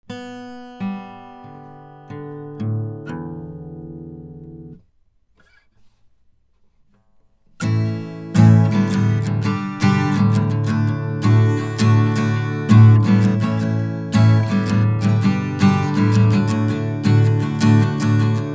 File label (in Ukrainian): gitara; Audient ID4 MKII - Гитара; Audient ID4 MKII это новое поколение уже знакомой модели ID4